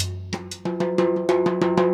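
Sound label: timbale cow, latin, 120bpm; Sound Effect Library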